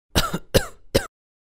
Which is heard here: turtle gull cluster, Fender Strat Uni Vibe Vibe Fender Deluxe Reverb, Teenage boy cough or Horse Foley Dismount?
Teenage boy cough